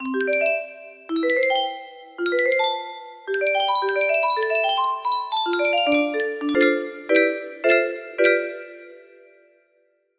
melody, music box

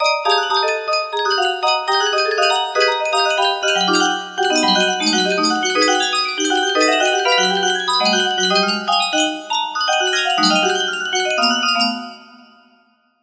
Stringed, music box melody

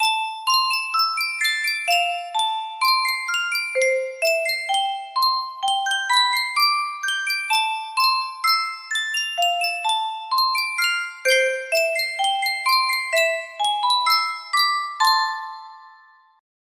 yunsheng custom tune music box

